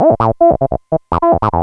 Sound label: square